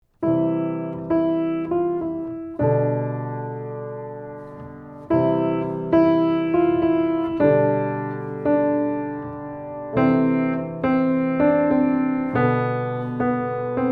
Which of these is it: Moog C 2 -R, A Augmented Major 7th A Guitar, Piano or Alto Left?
Piano